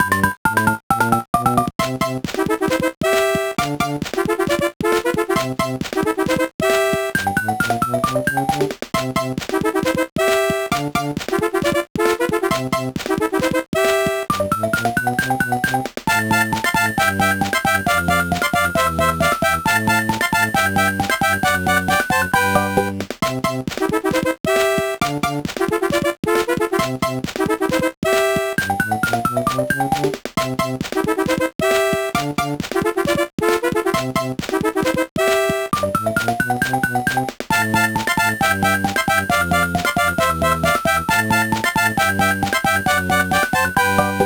Bonus Level; Super Mario Advance 4; Super Mario Brothers 3

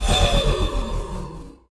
Match Close

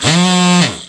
Lose

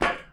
Metal Foot Step

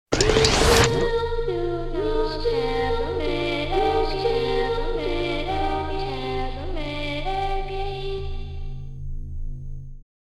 Welsh Melody; Fuzion Frenzy

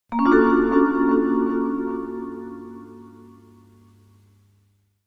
Secret Sound; Avocuddle; Tomb Raider 1

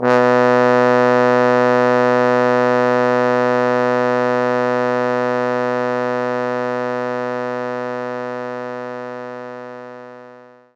Trombone B1; knights try

